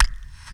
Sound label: VOC DRUM, MOUTH PERC; Best Service Hyper Dance